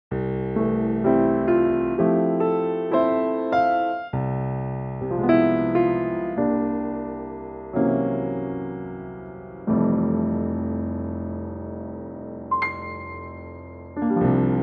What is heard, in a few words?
piano Gb; key - Gb (verse in F) - vocal range - Ab to Db (optional Eb); Pianotrak